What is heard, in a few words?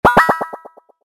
UI Quest